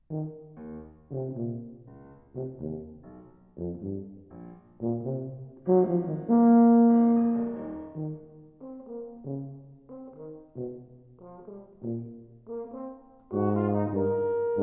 Suite for Horn, Tuba and Piano In a Jazz Manner

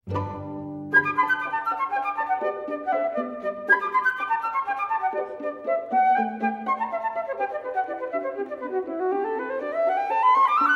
Flute, Piano and Strings - Scherzo

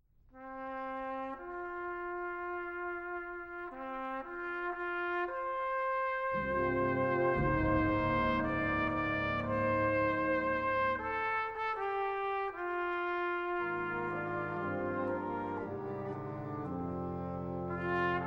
Folk Music for Trumpet and Wind Ensemble

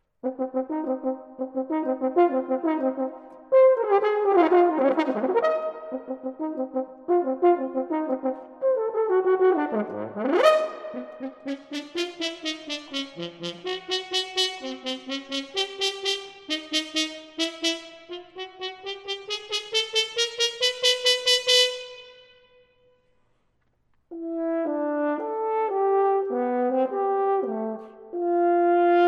Sonata For Horn Solo, Allegro Vivace, Moderato

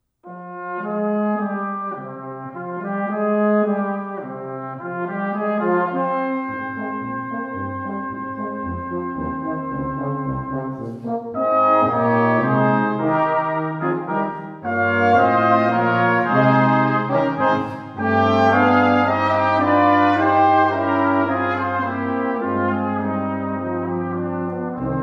Brass Quintet In D Flat Major, Allegro

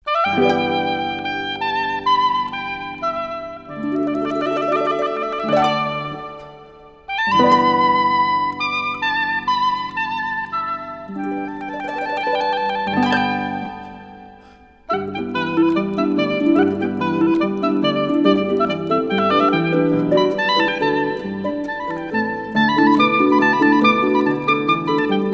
Chinese Folk Songs

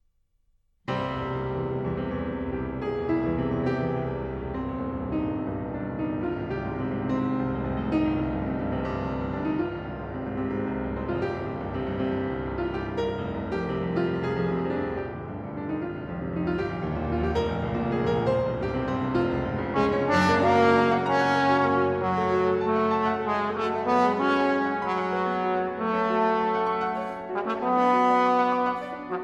Sonata For Cello & Piano, Allegro appassionato; American Voices

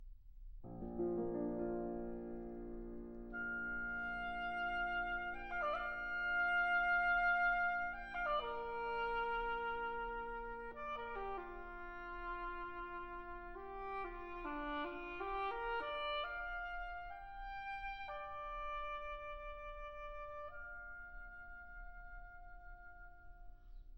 Sonata For Oboe And Piano, Ad libitum Allegro

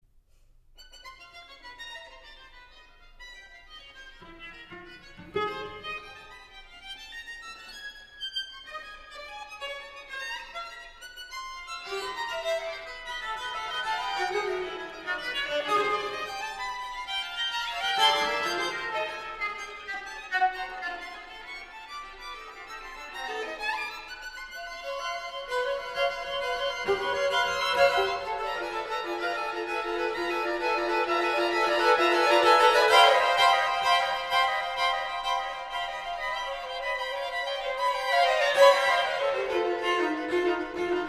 String Quartet, Presto; String Quartet No 2

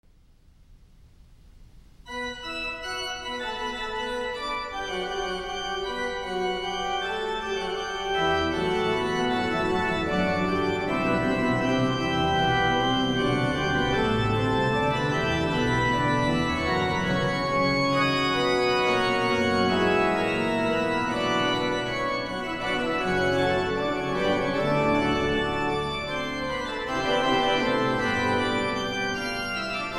Preludes, in e minor, Brass; II. No. 2

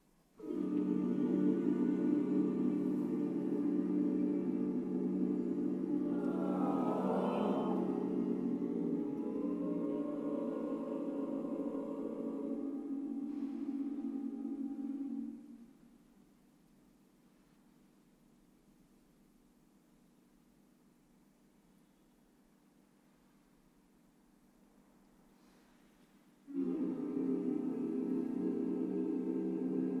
quarternote=60, Choral; BBC Singers

